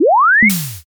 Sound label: warp jump